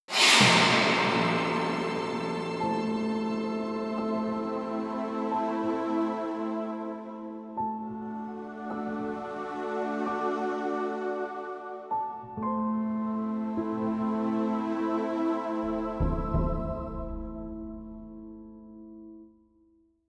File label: Attack Win; Ori and the Blind Forest; Sunken Glades Ori